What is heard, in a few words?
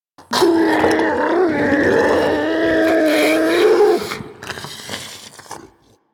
Ancient Shell Idle, Infested; Warframe; Ancient Shell Agent